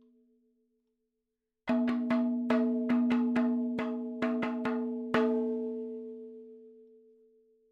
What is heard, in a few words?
70 3 4 Mad Fills L, madhalam, percussion; Sample Banks; MADALAM 70 3 4 FILES